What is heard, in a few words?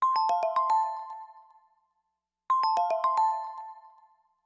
Ring, Windows 10